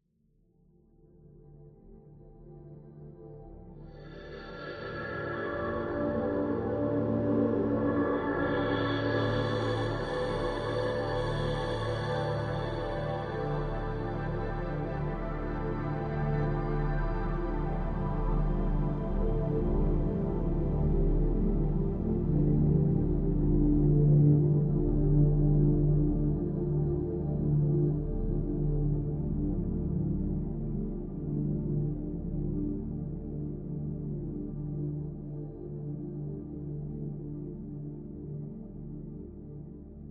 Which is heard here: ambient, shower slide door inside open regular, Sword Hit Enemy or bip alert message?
ambient